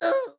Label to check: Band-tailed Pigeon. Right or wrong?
Wrong